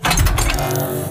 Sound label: Servo Jog; Titanfall 2